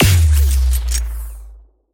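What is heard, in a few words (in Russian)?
активированная лазерная мина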